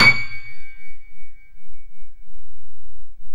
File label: PIA GRND, Grand; Sound & Vision; Gigapack I CD 2; PIA GRAND 1